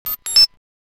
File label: Beep Stop, camera video rec sound; SKY IM-S500K; System Sounds